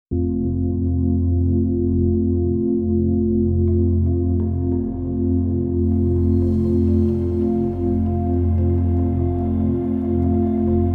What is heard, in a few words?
Deep Sleep Relaxation Meditation Music